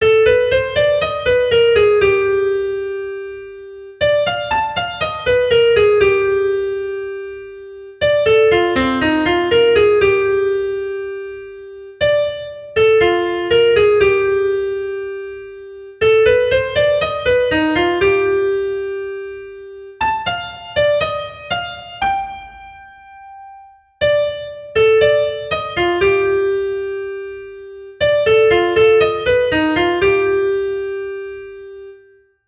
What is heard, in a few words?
altered scale